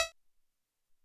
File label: Sci Fi